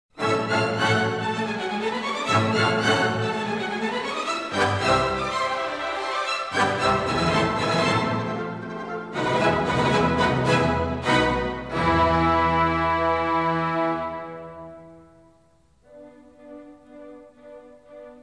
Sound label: Orchestra; Otto Ackermann Philharmonia